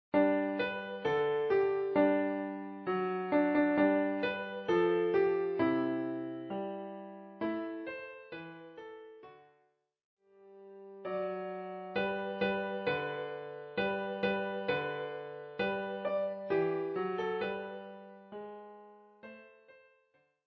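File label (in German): Klavier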